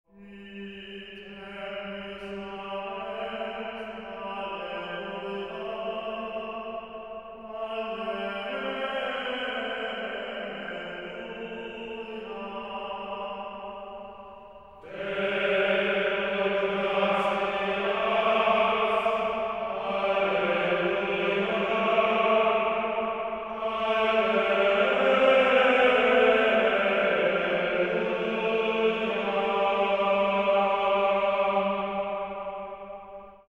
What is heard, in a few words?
Ite missa est chant, Ite missa est, alleluia, alleluia Deo gratias, alleluia, alleluia